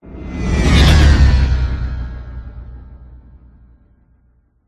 Passby Designed Whoosh Futuristic Scifi Airy Synth Spaceship Fast; PMSFX